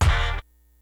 GREASY KIT, DRUMS; Kid Nepro; Elite

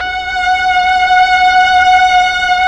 STR, Melo. Violins, Tron Violins

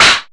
Claps; Vengeance - Essential Clubsounds Vol.2

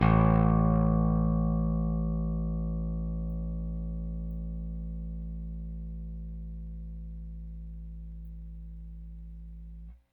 Rickenbacker Bass; Airwindows

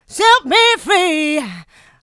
Female Vocals; Houseworx

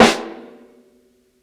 SNARE RIMSHOT; Basement drums vol 2

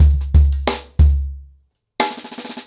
Nuclear 90BPM, ACOUSTIC DRUMS

